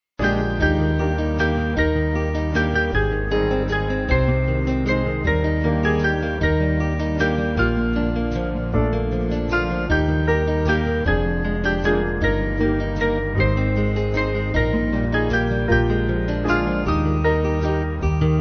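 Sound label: Band, Music Backing; It May Not Be; Rounsefell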